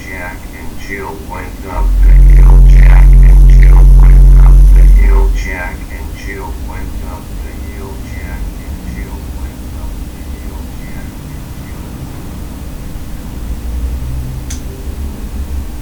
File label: low freq noise